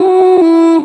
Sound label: Noises Vocal Shot